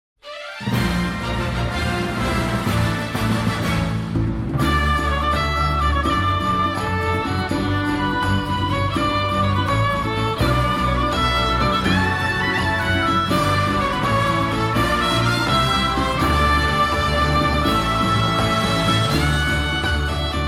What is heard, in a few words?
Victory Fanfare; Octopath Traveler 2